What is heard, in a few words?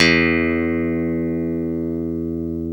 E1 Strat, clean; InVision Interactive; Lightware VOL-1; Instruments & Percussions